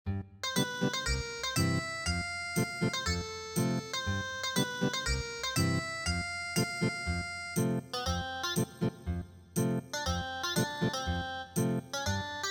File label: Ethnic Guitar; E HULI MAKOU